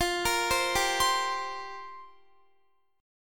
F Suspended 2nd Suspended 4th F down Guitar; Fsus2sus4; arpeggiated

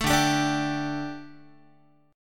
D-Suspended 4th Guitar, Dsus4 chord; strummed

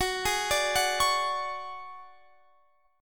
D Major 7th Flat 5th, chord

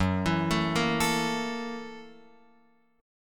F sharp 7th Sharp 9th, Guitar, Open F; arpeggiated; JGuitar

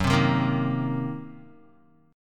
Gb 6th Flat 5th Gb down Guitar; strummed; Gb6b5; JGuitar

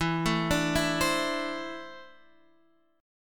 E 7th Suspended 4th Sharp 5th, Guitar, Open D

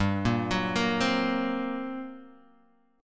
G Minor Major 7th Double Flat 5th G; arpeggiated

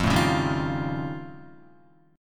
F Augmented 9th F Guitar, chord; F+9 strummed; JGuitar

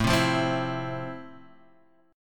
F Major 7th A

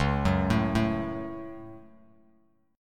Db Suspended 4th Sharp 5th, Bass Standard; arpeggiated; JGuitar

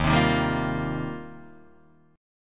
Eb 6th Add 9th, Guitar, Open D

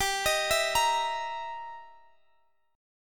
E Minor Major 7th Flat 5th G

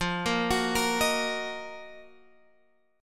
F sharp Major 13th F

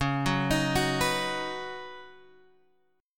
C sharp 9th Suspended 4th C sharp, chord; C#9sus4 Chord {9 9 X 8 7 7} arpeggiated

